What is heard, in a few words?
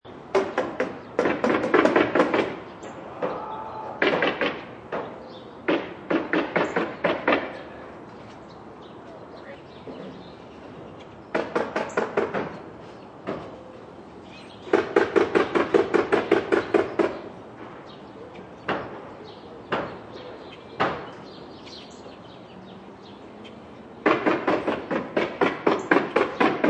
Contractors working on house; Contractors Hammer on House; Construction; Backgrounds